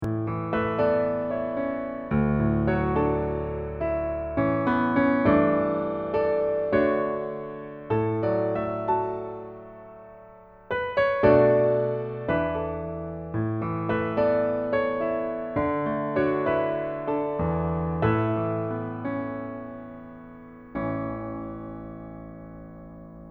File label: accomp